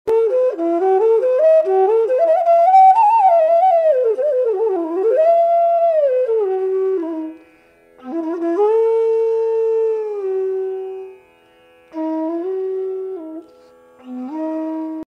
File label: Bansuri; فلوت بامبو هندی